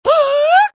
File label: hoooooh; street fighter alpha